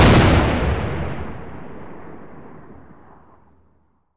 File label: explosion; civ13; civ14; Civ Weapons Explosives Explosions